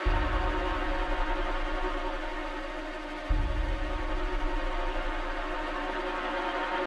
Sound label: Music Stingers Tension